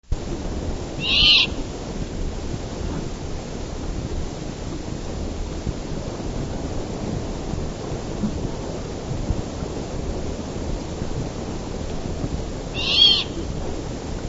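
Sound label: great horned owl juvenile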